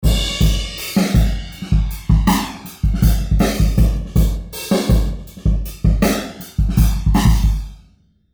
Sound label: Flanger